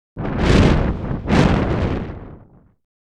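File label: fire fireball double; Asian Show Sound Effects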